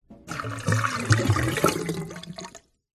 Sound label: household kitchen sink metal water drain plug hole short gurgle